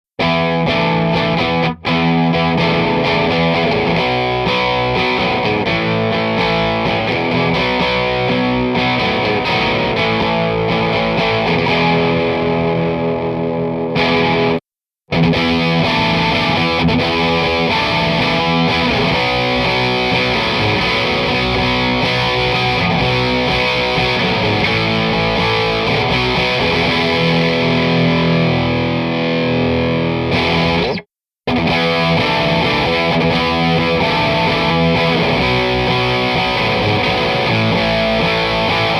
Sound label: guitar